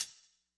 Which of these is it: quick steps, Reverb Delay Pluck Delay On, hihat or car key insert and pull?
hihat